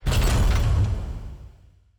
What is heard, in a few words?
astral action unlocking; astral planes